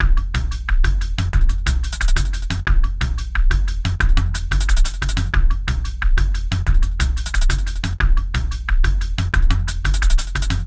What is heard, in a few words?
drums, 90bpm; Demo Multitracks